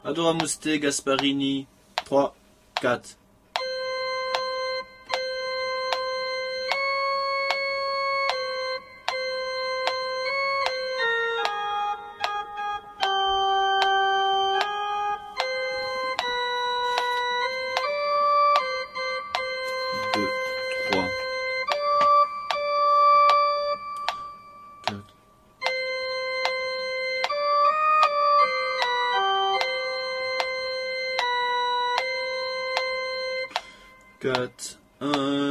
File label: Tenor; Gasparini; Adoramus te